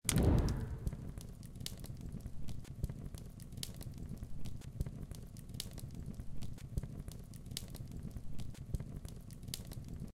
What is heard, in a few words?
sound fire